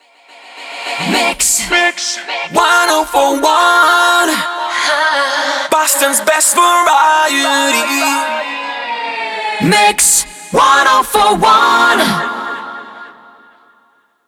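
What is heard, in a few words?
105bpm MIX, jingle; WBMX 2017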